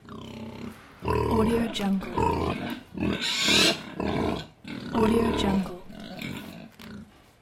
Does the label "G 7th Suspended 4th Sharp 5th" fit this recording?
No